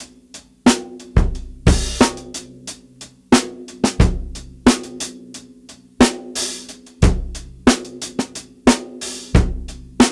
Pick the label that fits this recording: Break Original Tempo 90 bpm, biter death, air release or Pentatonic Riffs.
Break Original Tempo 90 bpm